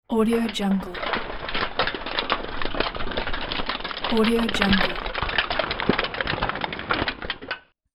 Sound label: industrial debris rubble